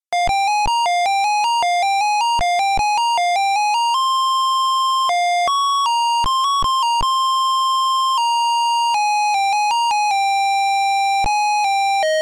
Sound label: pc speaker